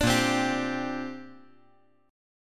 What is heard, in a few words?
Bb 6th Add 9th Bb; Bb6add9 strummed; Bb6add9 Chord